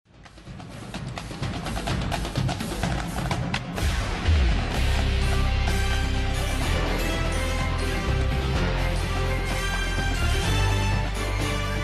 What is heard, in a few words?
the drums